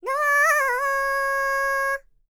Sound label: BULGARIAN, World Voices, BALKAN